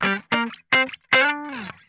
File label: GLIDE, Funk Guitar; Zero G - Funk Guitar